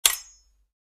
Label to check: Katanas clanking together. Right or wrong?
Right